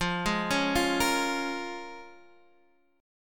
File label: Bb Minor 7th, guitar